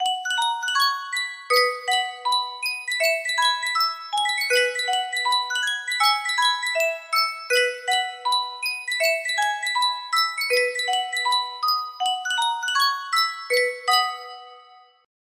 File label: music box; sankyo